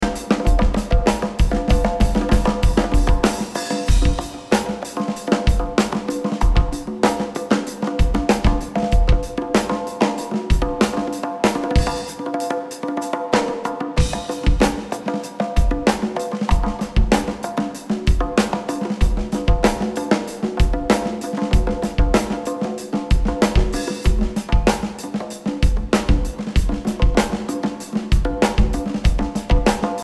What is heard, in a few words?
Drums